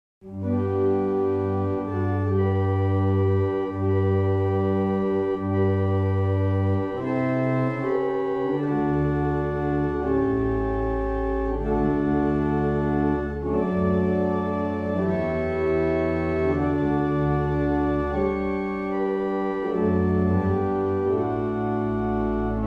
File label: Morning Hymn, barrel organs; Meldreth